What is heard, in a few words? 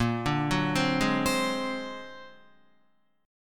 Bb Augmented 9th Bb, Guitar; Bb+9 arpeggiated; JGuitar